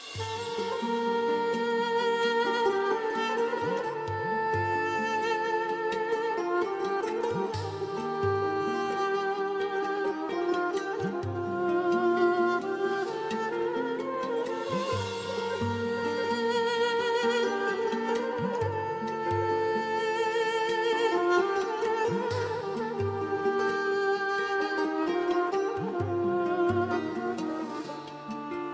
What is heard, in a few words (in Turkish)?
Duygusal Fon